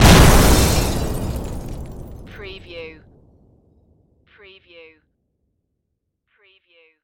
Explosion Debris; Sound Effects Bible

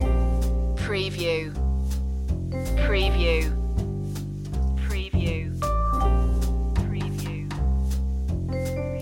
jazzy room loop

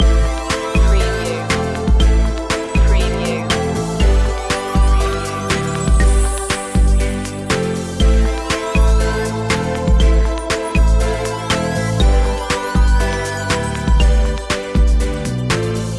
it girls loop

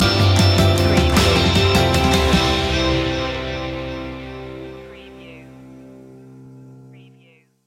shining through stinger

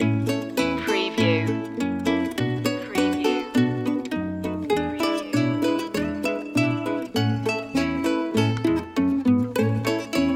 jingle bells ukulele

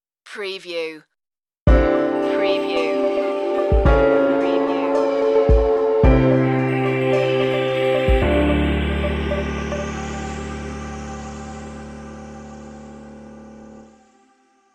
lounge water stinger

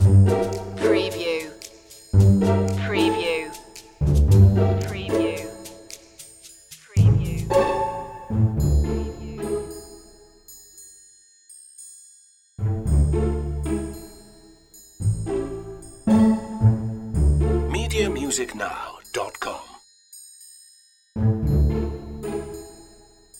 pondering

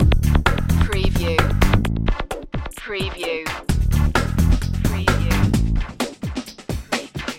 power beat loop

